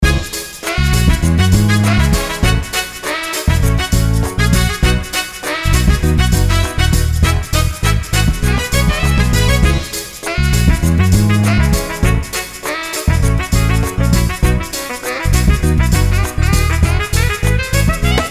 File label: Big City Big Night